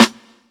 round snare drum sound a sharp key, steel snare drum tuned to the A# note; snare drum samples